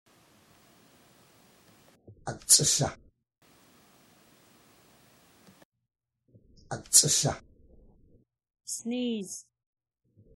Sneeze

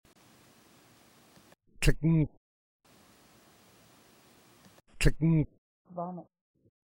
throw up

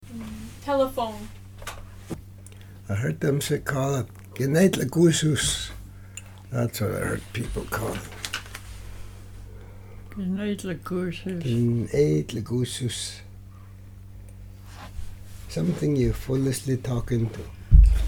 Telephone

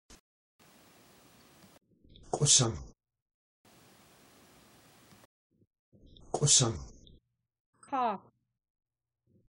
cough

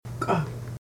Laugh

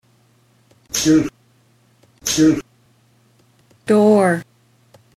door

